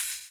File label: HHO, Dance Mega Drums; Best Service